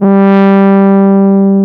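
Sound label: French horn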